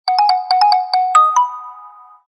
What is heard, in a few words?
Short tone; Samsung; GT-S3650 Corby